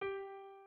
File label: Guitar So